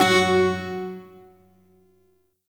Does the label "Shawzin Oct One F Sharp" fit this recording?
Yes